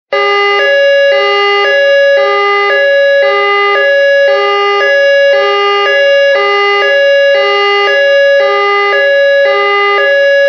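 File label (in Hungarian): Tuzoltosag Szirena, fire brigade siren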